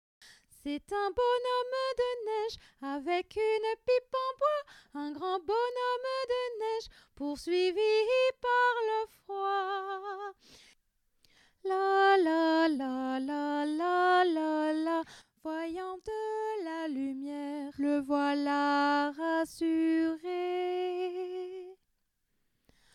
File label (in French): chanson pour les enfants hommes